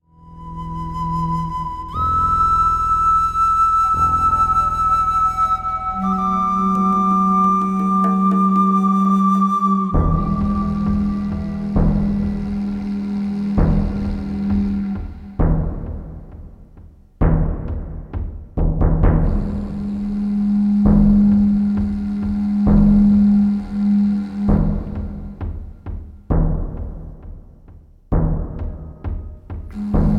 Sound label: Old Traditions (Perc Remix); Total Overdose